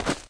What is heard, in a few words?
Sand